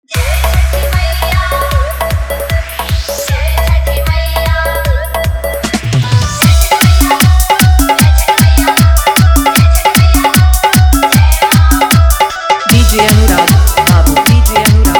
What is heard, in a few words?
Chath Puja Full Dhollki Bass Dance